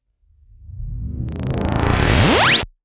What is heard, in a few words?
Zap, PsyTrance